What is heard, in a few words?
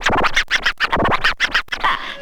scratch short, Vinyl scratches